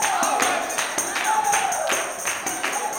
160 TAMB BRK; Blues & Soul

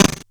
Bent Snare; glitch; Bent Hits

